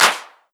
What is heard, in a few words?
Clap Layered; One Shots; Drums; Ableton Live 12 Lite; Core Library